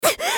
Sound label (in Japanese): 被ダメージ; ミフユ